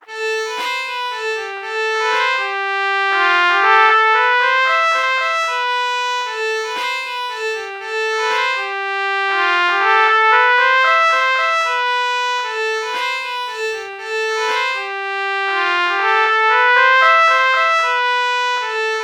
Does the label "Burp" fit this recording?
No